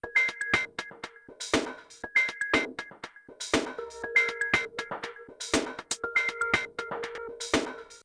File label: Synloop, Nokia; 5030b